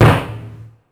Snare, Ensoniq ASR-X Pro

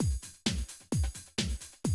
130, Classic DrumLoops

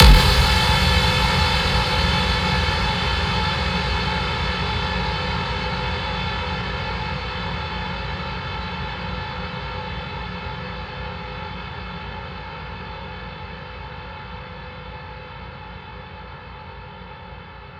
Industrial; Sonic Foundry (Sony Creative Software); Underground UK House & Electro

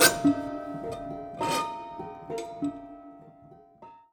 Prepared Piano; Battery 3 Sample Kits

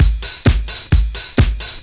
straight, Techno Trance Essentials drum loops; Ueberschall

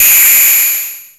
WN FM HIT, ANALOG PERC; Zero-G Ambient 1

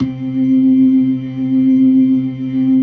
LO MAN V L, LOMANUAL VIB; InVision Interactive; Keith Emerson

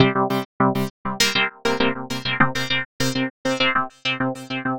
synth 100 seq C G Ab; Transmission-X

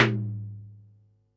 snaredrum, Pearl SC-40

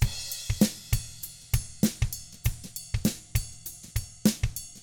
FUNKY Drums; ILIO; Double Platinum Drums 1; 99 FUNKY 2

